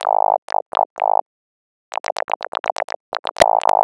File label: sticky chops Ab 125, synth loop; Zero-G; Soundclash; Nu Dance Electro